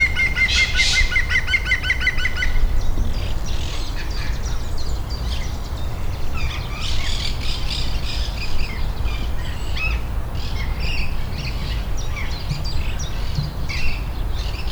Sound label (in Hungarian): az elejen es a vegen is, zold kullo; debreceni zoo 2012; marantz puska